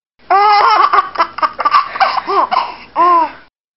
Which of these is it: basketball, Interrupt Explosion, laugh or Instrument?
laugh